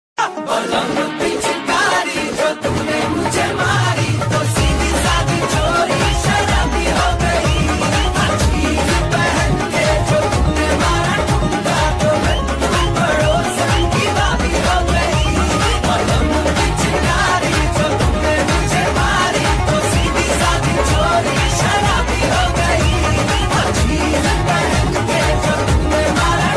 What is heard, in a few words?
slowed reverb lofi remix ringtone love ringtone; happy holi